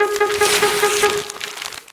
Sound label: mail delete; Cosmic Rage; general comms